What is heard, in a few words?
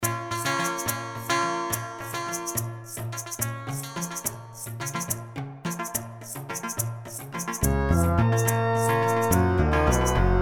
drum